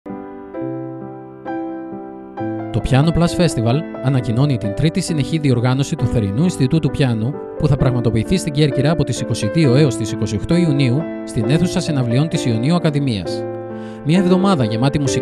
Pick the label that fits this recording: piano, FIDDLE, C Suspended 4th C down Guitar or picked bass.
piano